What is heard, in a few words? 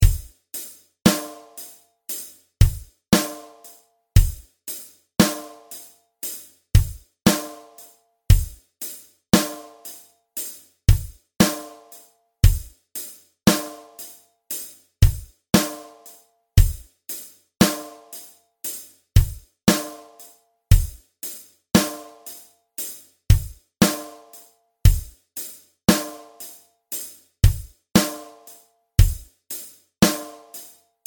country riff, guitar